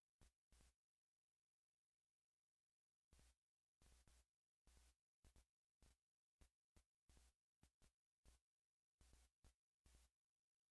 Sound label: Melody; chippy